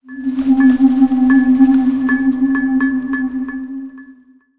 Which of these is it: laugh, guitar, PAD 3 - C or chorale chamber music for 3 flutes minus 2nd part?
PAD 3 - C